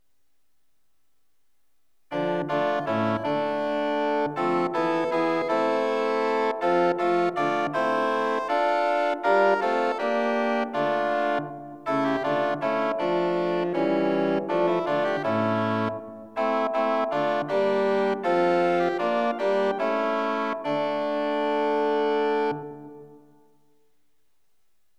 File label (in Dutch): Hymne; Jerusalem, gij schone stad